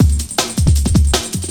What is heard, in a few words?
Drum Loops, 158.5; Jungle